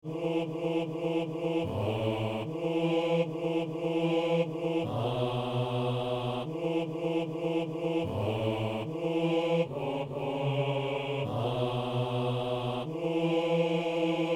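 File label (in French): entends nos prières, basse; Saint-Denis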